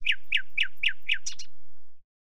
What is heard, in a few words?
birdcall, ambient